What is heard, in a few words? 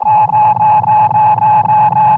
Classic FX; radiosonics; Classic Simple OSC FX